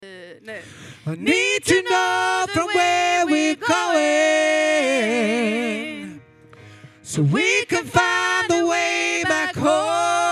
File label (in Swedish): sopran